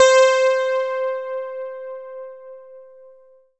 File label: SY85 DIG, DIGISYNTH; Best Service; Alpine Volksmusik